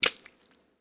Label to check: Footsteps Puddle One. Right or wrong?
Right